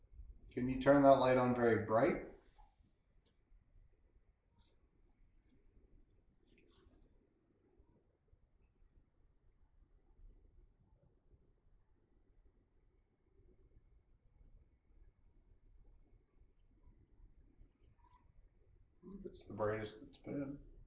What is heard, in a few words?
Chessie Room EVP Flashlight